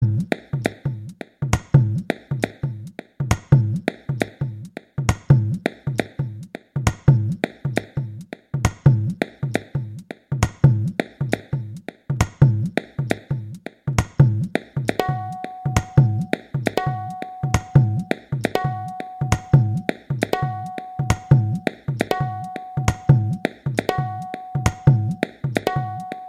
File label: tabla